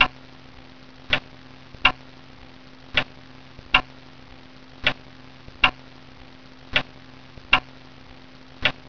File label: CLOCK; 999wavs